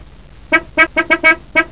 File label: Car beep